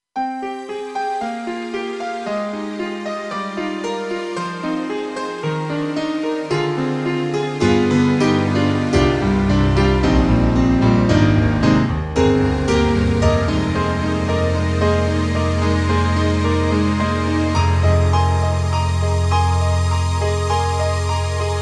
Piano and Strings; KORG EXB-PCM08; TRITON-Rack

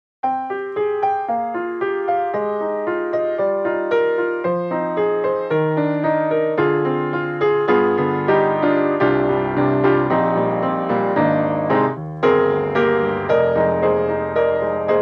Modern Upright, Old Meditation; XLN Audio; Addictive Keys

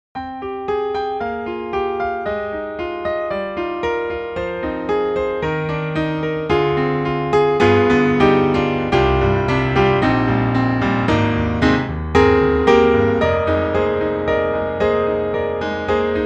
CFX CONCERT GRAND, Contemporary, Bright Poke; GARRITAN

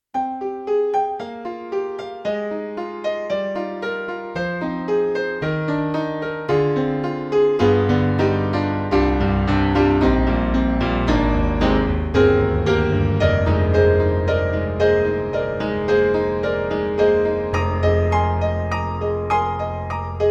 Acoustic Piano Dark Grand; Alesis NanoPiano

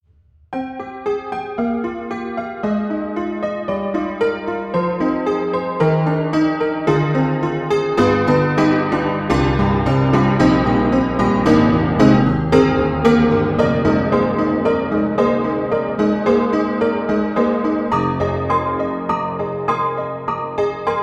D4 Ruined; MODARTT S.A.S.; Pianoteq

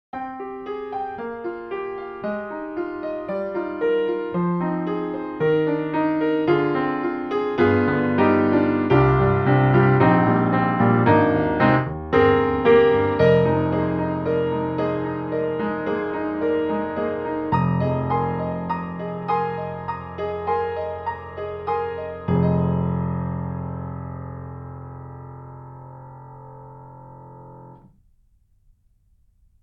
Binaural; Soniccouture; The Hammersmith Professional Edition

